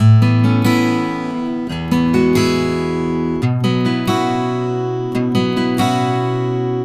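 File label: Acoustic Pop Guitar 140BPM E major; Vengeance - Essential Clubsounds Vol.4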